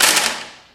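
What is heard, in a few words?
physics clipboard impact small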